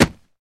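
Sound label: Hit Soft Single, cloth movement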